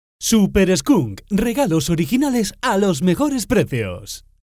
nuevo jingle; ofertas

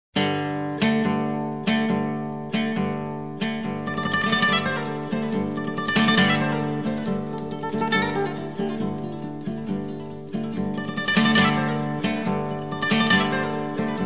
Guitarra Espanola

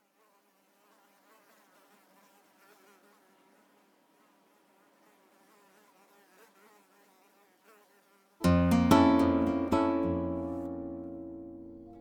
Andaluza clasica instrumental

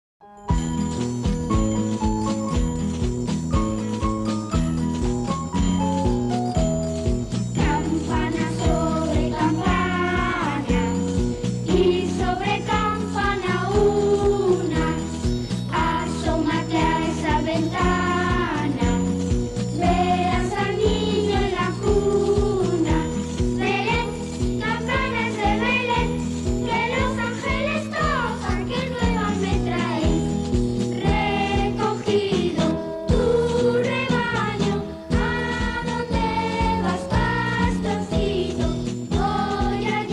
Coro Infantil de Villancicos Populares, villancico popular; Campana Sobre Campana; Navidad

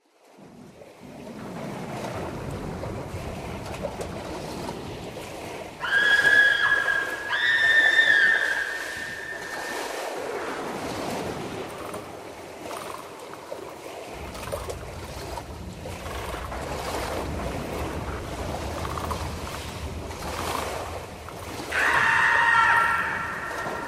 Near The Water, sonidos terror

